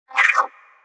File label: Cat meow; super mombo quest